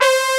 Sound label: LINEAR SYNTH